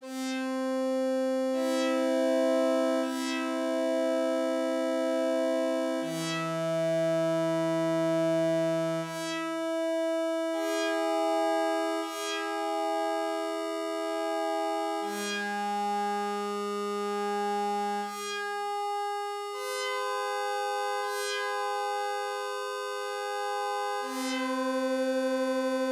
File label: diesis major thirds